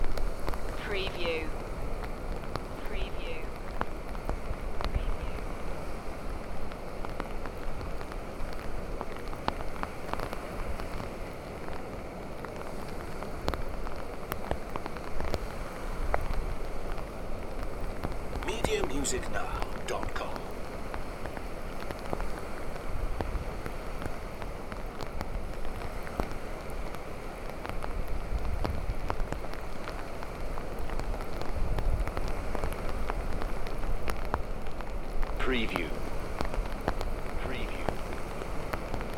Rain on a hood near the sea loop

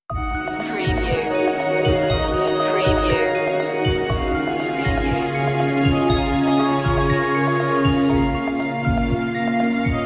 heartbeat

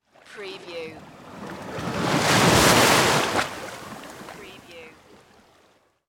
Ocean Waves Crash

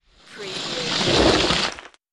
Skiing, Snowboarding, Coming in and stopping

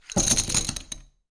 Poker Chips Bunch Of Chips Being Dropped On Felt

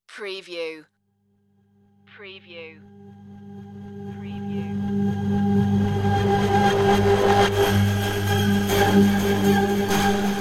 shiny gleam revelation

